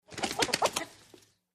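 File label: Hen Startled Flap Wing, chickens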